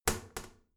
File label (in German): toilet lid wall open regular, badezimmer